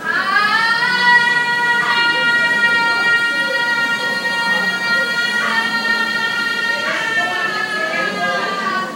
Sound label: ahhhhhhhhhhhhhh